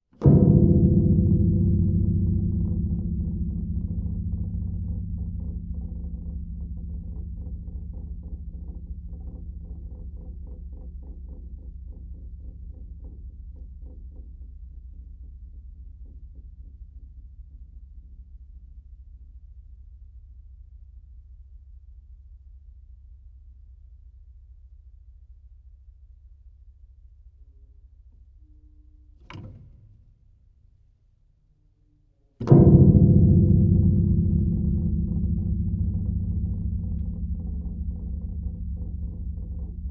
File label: Piano Very Note Low Dong; Pianos; Musical; Tom Hutchings